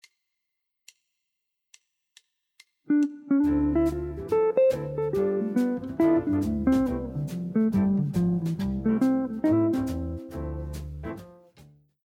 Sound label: II V I, jazz guitar